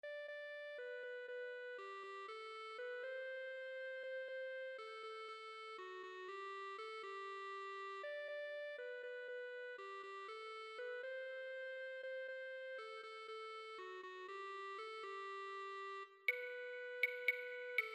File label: PERC